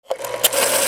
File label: OFFICE